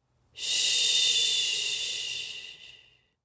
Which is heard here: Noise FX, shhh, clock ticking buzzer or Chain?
shhh